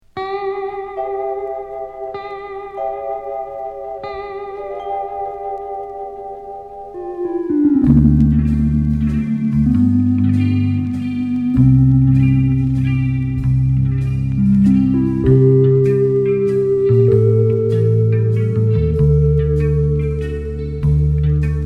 orchestra

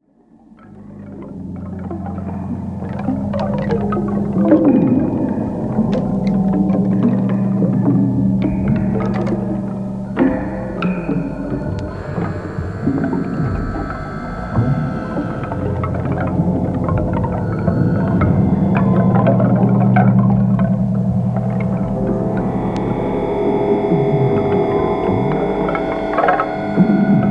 new zealand electroacoustic

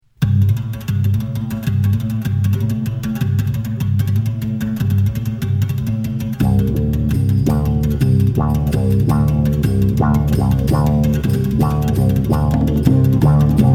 oriental wind

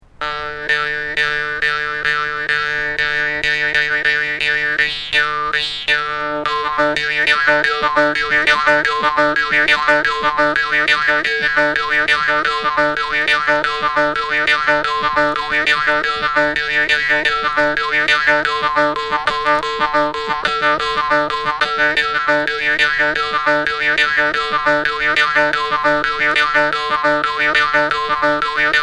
drums and rhythms

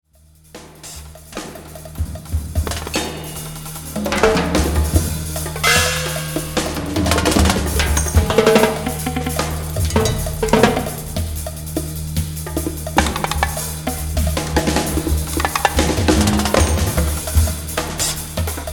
four drummers drumming

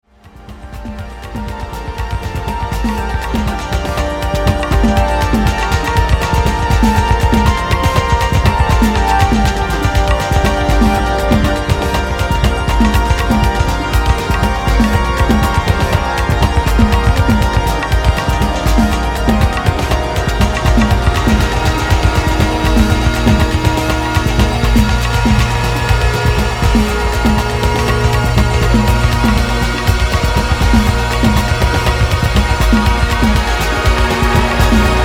rhythm and noise

